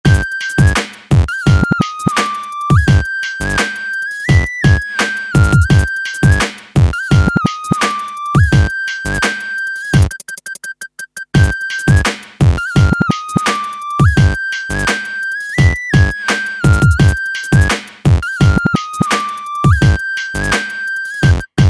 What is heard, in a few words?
desert loop; retro detonation